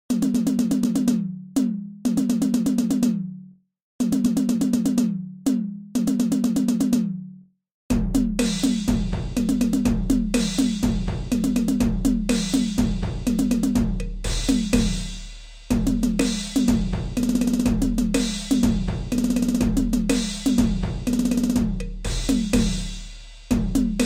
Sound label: animation groove; midi